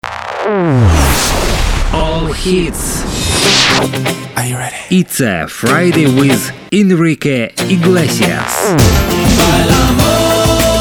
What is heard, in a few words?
JINGLE